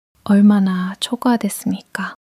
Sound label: struggle